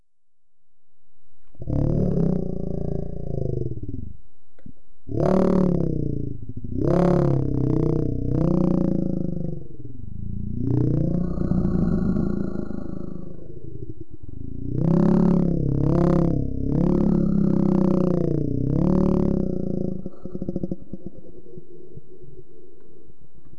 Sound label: Scary Monster Sounds